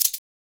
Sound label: SHAKER, Modern Percussion Loops